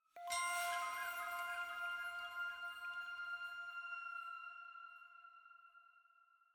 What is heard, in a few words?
item Jingle Flute Chime F